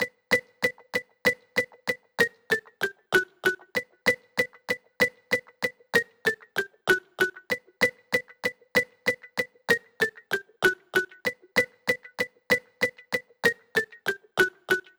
Minimal Kit Melody; Mutekki Media; Vengeance Electroshock Vol.2

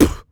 Human Beatbox, Beatbox Hits; Loopmasters; Vocal Hits And Kits; Human Beatbox 1

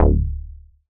Bass Shot D

